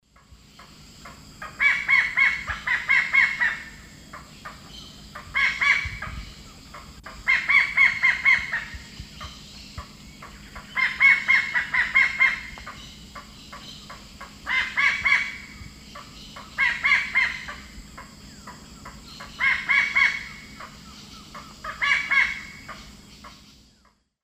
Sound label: Heliornis fulica, alarm calls; S. America; Eastern Lowlands